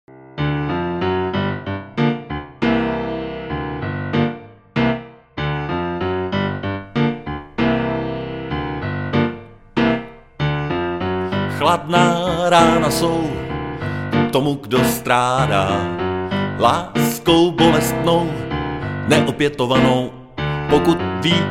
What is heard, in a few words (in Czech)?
klavir